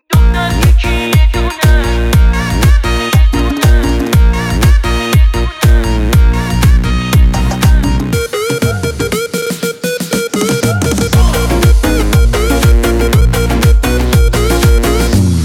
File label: music happy birthday